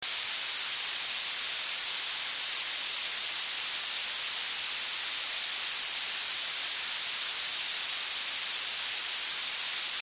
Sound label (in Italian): esempio rumore viola; Elettronica